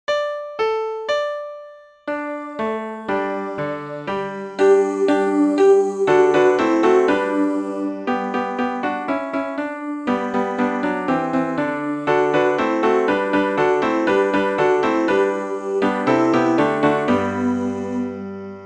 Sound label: Alto